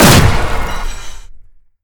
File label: shoot; npc; rtrooper